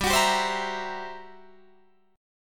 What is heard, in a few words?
G Minor Major 13th, G, Guitar, DADGAD